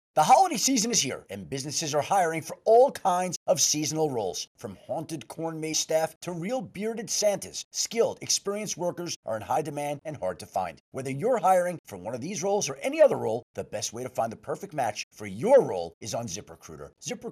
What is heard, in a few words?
ZIP OFFBEAT SPORTS